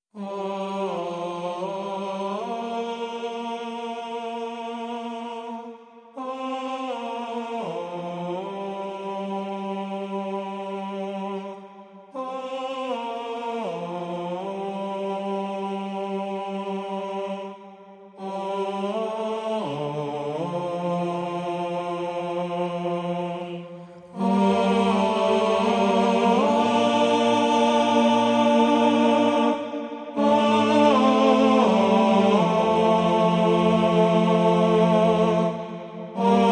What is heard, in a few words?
Choir Stem